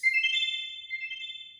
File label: glee emote; animal crossing